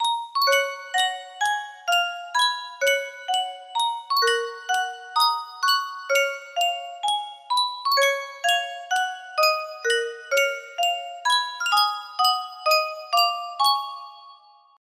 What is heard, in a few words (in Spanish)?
Yunsheng caja de musica